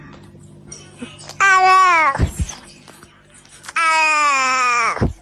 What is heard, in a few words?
awo cat